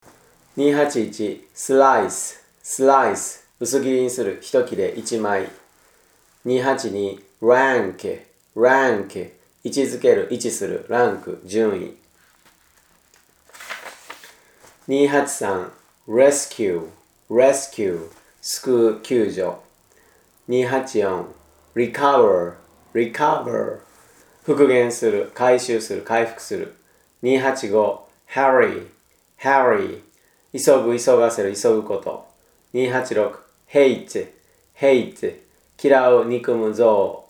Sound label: tango